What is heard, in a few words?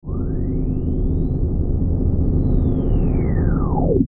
Ascend Bass Swell Flange; Blastwave